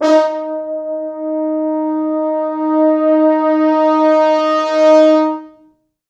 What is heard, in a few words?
4 FrHorns; D#3; East West; Quantum Leap Horns Sax; 4 FrHorns MOD